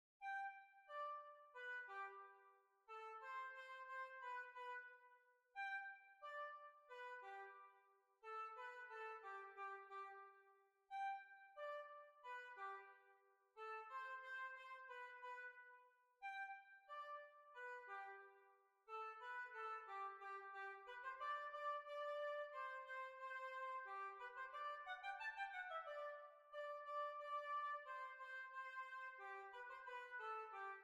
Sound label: Hornpipe; Steam Packet Hornpipe